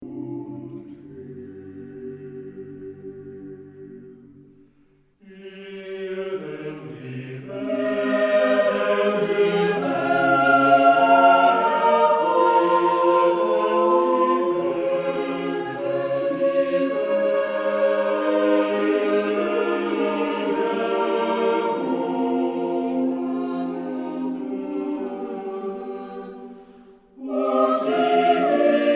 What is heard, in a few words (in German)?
chor; Herr bleib bei uns